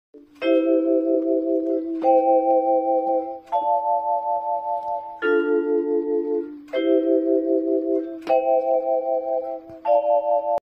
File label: Vibraphone